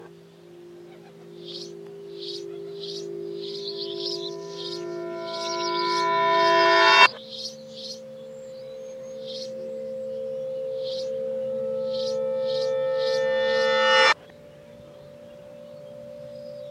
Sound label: bell; loopzone2